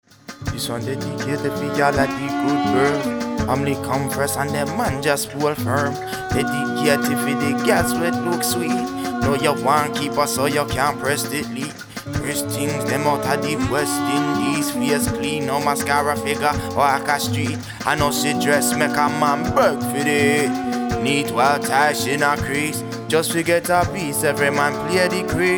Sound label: French Lullabye